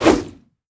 attack whoosh, antlion; creatures

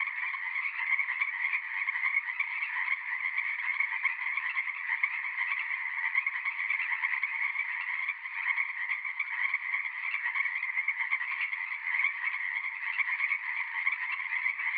frog bed

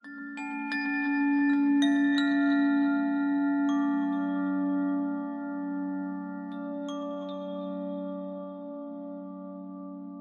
ambient abstract chimes high; Half Life Model Pack

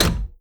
end, wheel

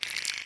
slobber, clams; infestation

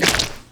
fs fm paint, footsteps; Portal 2

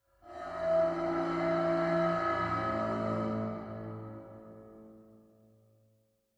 cymbal med; Half Life Alyx; cymbals

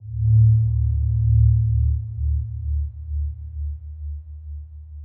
tenement low pulse; ambient; lfe; Half Life Alyx

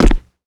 land rubber, footsteps; player

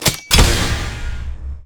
item drop; Counter Strike Model Pack; CS:GO

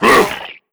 attack pre, zombie vox; creatures

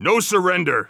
scared emote; Counter Strike Model Pack; CS:GO; phoenix coop

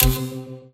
hacking sphere ping off

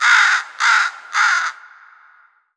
bird bird bird; Portal 2; threesies

